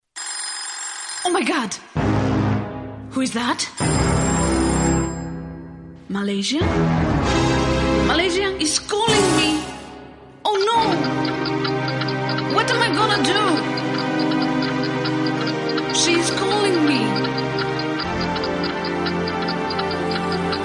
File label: suspense